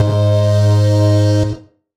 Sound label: Bass Shot G#